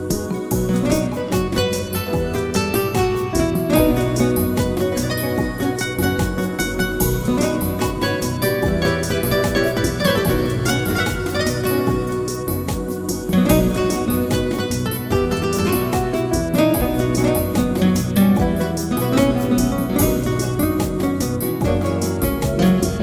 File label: Dance the Bossa Nova